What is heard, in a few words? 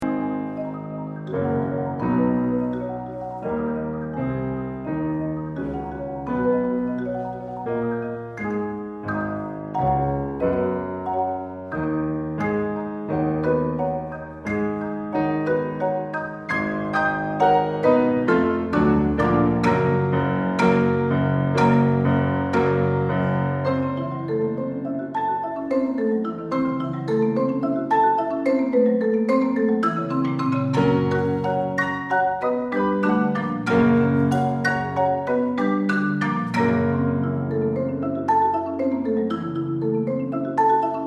marimba piano